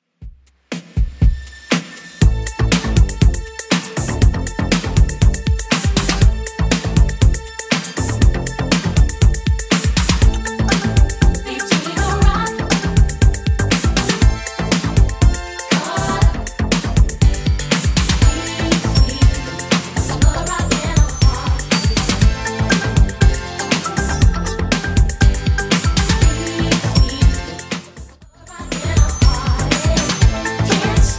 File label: 80s Redrum 120 bpm CLEAN